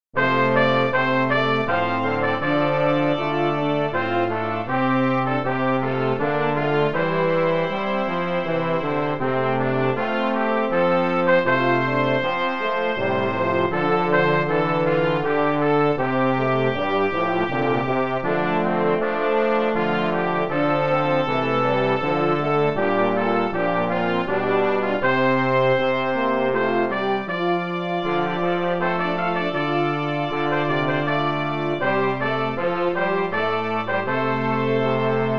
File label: brass